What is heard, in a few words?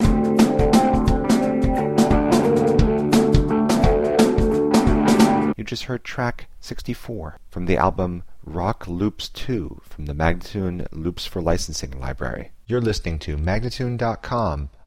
087 D spoken, Rock Loops; spinecar; Loops For Licensing; MagnaLoops